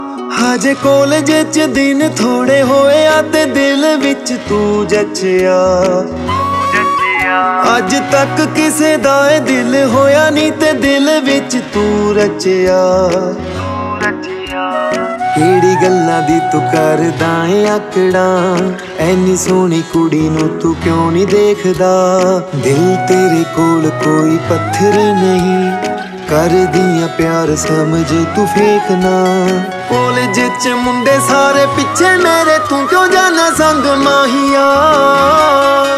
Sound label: Punjabi Ringtone